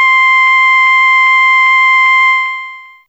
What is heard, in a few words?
Soprano C5, Humans; Fairlight CMI IIe